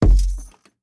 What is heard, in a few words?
Footsteps Wood Walk; Devour; The Town